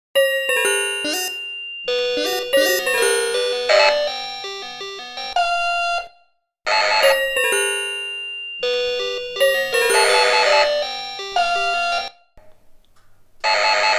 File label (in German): Funktionsklaenge Sounds